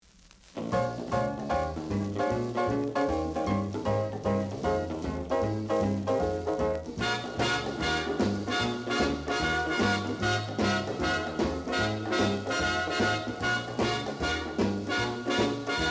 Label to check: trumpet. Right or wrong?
Right